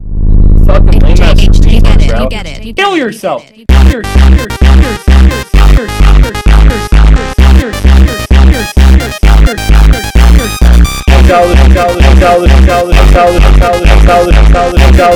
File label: Phonk